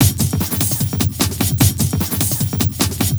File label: Beat, Industrial